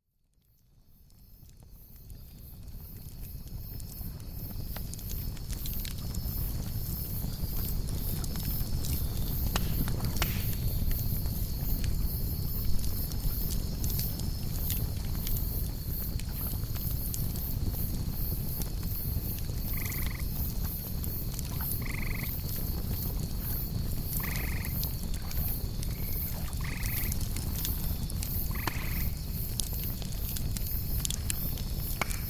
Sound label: Lakeside Campfire with Relaxing Nature Night Sounds